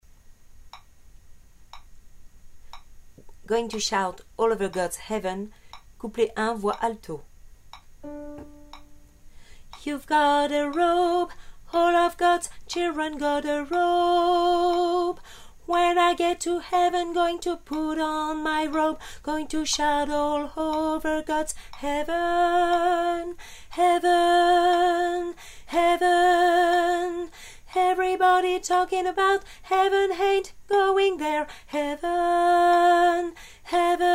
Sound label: alto; going to shout all over gods heaven